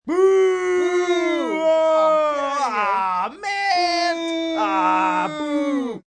Group Boo Aw Man, Booing, small group. 2-3 Males only, sports (human voice); Sound Effects:Voice; Voice Box